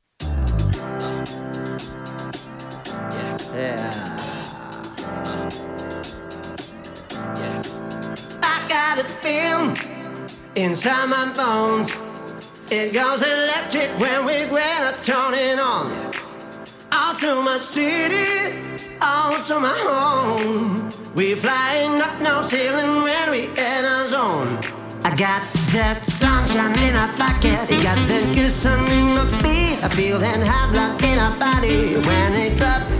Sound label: saxofon